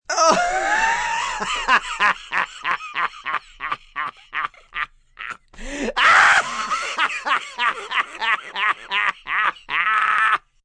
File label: Laugh Hard, Laugh, male, hysterical, raspy & out of breath (human voice)